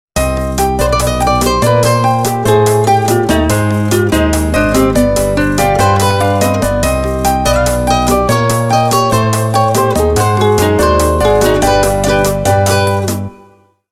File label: going solo, ringtones; Sony Xperia SP